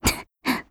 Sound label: laugh; Eternal Return; Katja; KOR